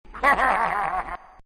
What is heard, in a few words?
elite laugh; elites